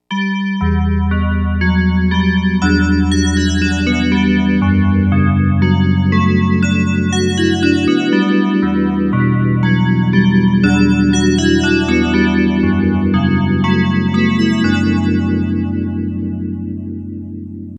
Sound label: dx7 bells tc xii re